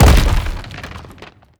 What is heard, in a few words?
bash medium, brickwall, destruction